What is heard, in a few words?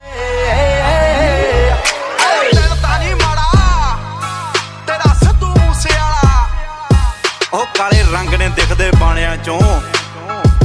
Punjabi Ringtones